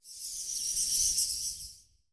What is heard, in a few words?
Ambience bats; adams venture origins